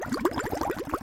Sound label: fume; soundspvz